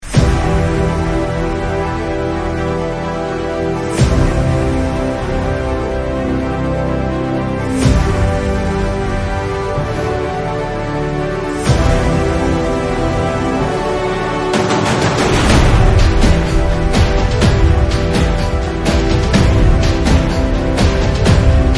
Karaoke

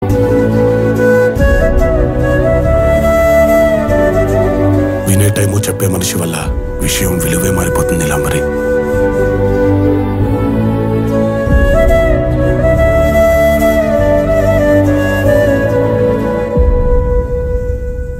Flute Ringtone; Aravinda sametha

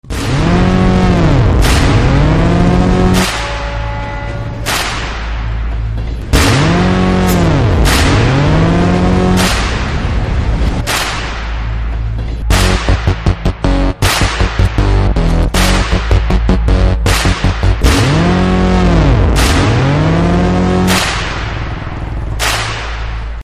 Tamil Trailer BGM; Adithya Varma

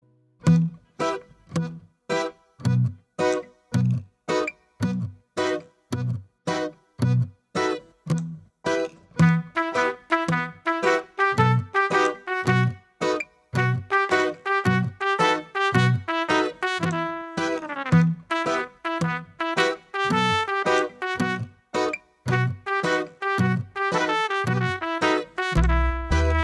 Bgm

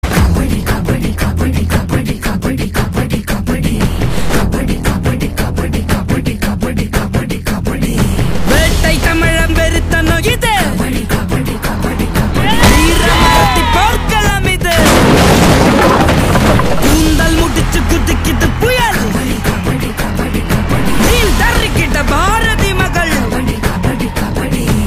Female Version Ringtone

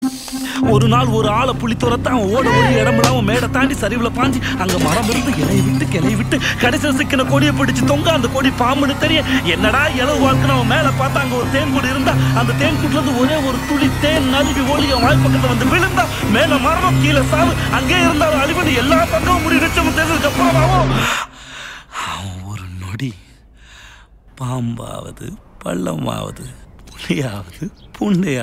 Trailer Ringtone; Super Deluxe

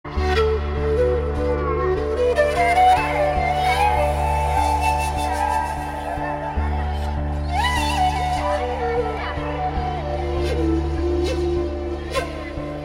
Flute Bgm

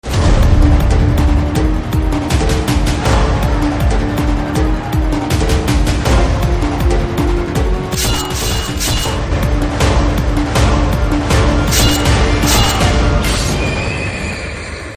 Trailer BGM Ringtone

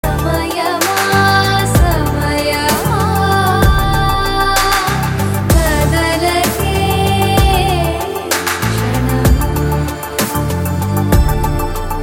Female Voice Ringtone

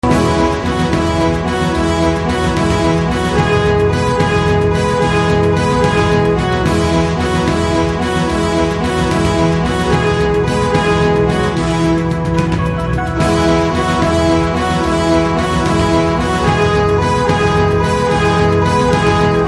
BGM Tone

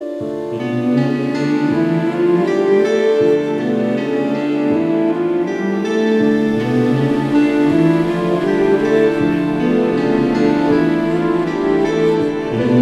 Flute

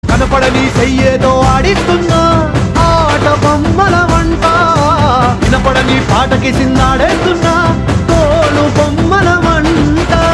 Ringtone

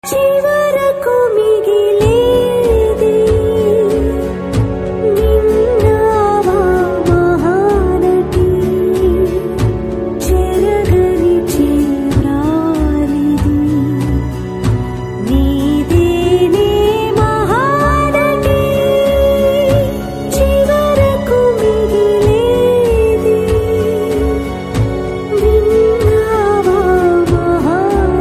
Ringtone

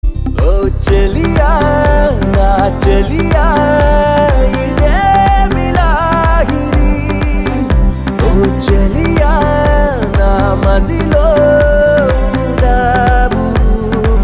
Male Ringtone

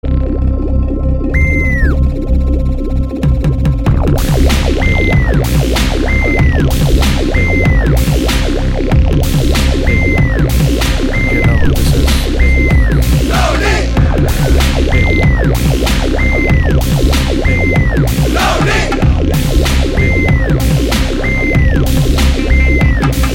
Anthem BGM; Rowdy

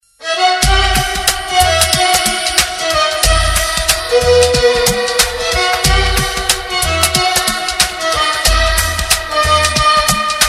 Love Instrumental

